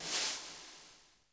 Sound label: ROLL, BRUSH KITS; Crypton - DrumBase5000